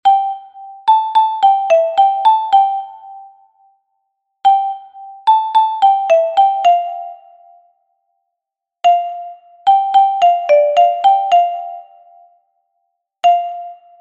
Xilofono Soprano; Rumba Cinco Marinheiros

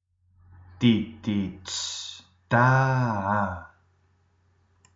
RITMO

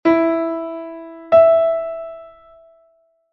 8ª Xusta MI-MI´

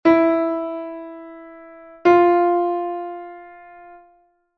tono-semitono mi-fa; tones and semitones

